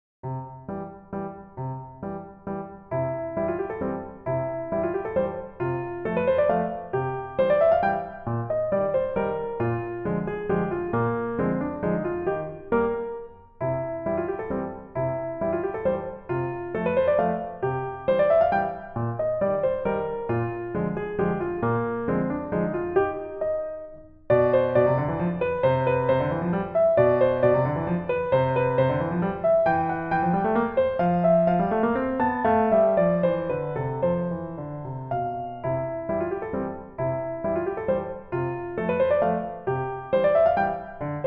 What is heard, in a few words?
Piano Solo Etude